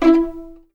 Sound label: E4 PIZ MF, string; East West; The Ultimate String Collection